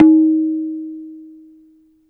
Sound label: tabla tun; drums; one-shots